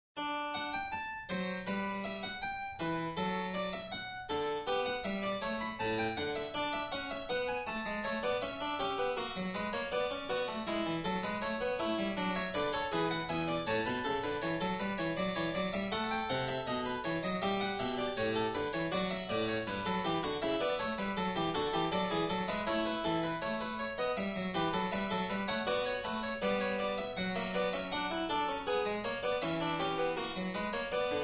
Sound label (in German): Inventionen Fur Klavier; Johann Sebastian Bach; BWV789; Ludwig van Beethoven